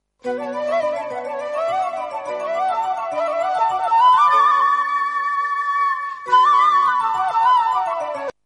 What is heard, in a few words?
nostalgia kerala instrumental, ringtone